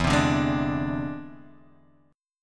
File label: E Augmented 7th E down; E+7 strummed; JGuitar